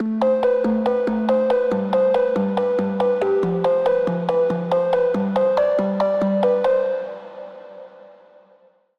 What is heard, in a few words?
Pluck; Routed Sound; Spire Expansion - Flame Summoner Vol.1